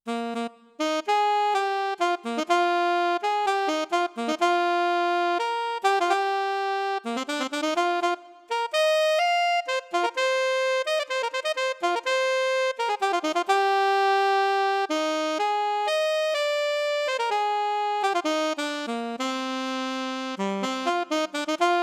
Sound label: Alto Saxophone Technical